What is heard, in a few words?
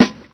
fresh good acoustic snare sound a sharp key, acoustic snare sound tuned to; snare drum samples